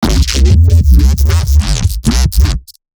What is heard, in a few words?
neuro thing, bass